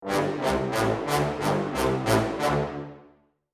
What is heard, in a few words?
bouncy low brass